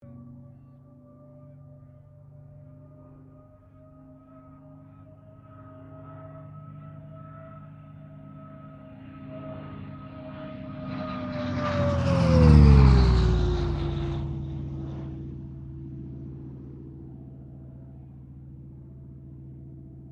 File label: AIRCRAFT CA-13 BOOMERANG PASS